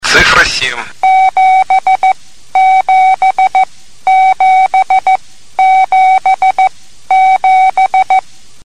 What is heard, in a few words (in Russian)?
CW, все символы повторяются 5 раз